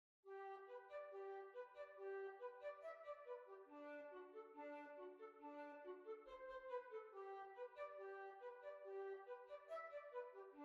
Hillebolla Schottis

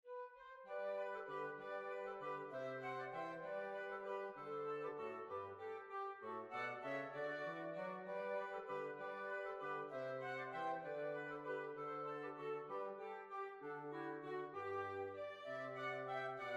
Harry Enfields Waltz

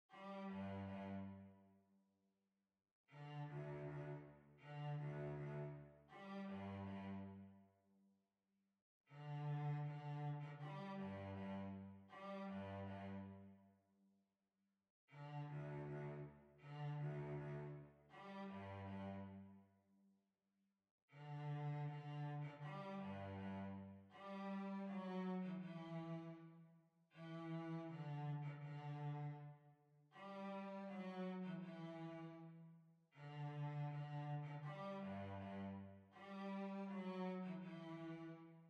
Hornpipe, Hornpipe, Violoncello

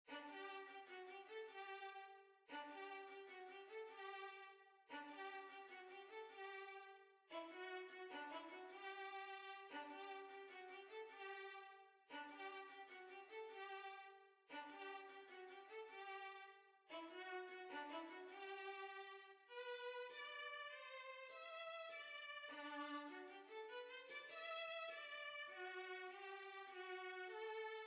Violin

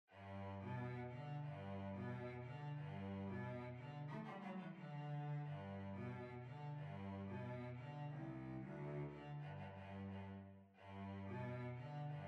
Violoncello

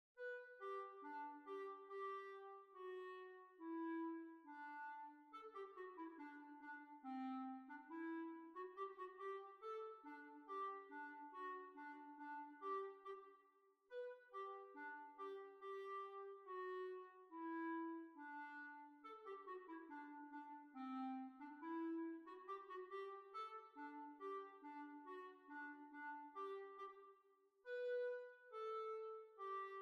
Hornpipe; London Hornpipe; B flat

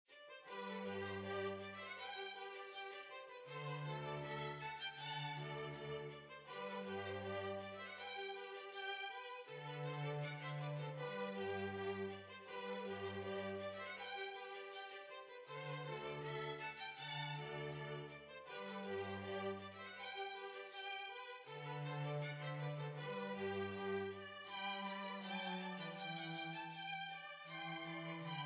Hornpipe, Hornpipe

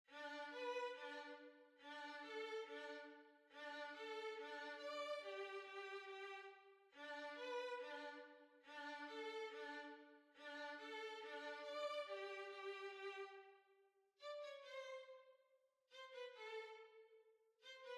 Hornpipe, Violin

